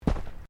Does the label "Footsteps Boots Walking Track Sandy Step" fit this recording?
Yes